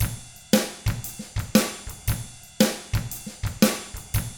SHUFFLER, Drums; ILIO; Double Platinum Drums 1; 116 SHUFFLER

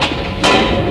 scifi, SCIFI & SWEEPS; Techno Trance Essentials

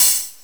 L-CYMBAL

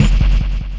Jungle Kick; Sonic Foundry (Sony Creative Software); Esoterik Beatz; Jungle Kit

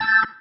Stab, Organ, One Shots

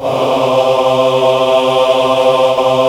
AAH; AMG - Vince Clarke Lucky Bastard

